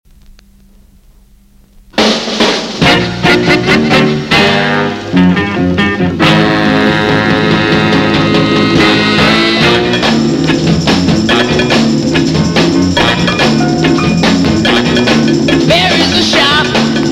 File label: SAXOPHONE; Smash EX